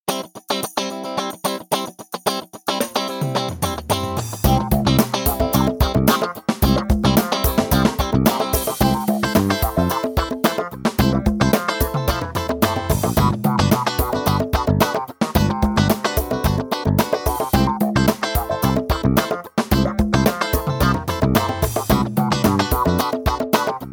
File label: Funk, ringtones; Infinix Hot 50 5G